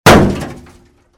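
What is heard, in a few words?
hit on the hood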